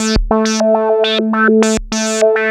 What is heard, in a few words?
A3, VCF; CMP - Analogon; SH-VCF